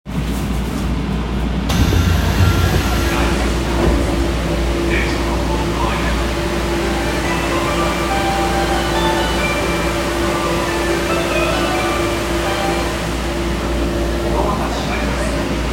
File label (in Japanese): 銀座線外苑前駅東, train sounds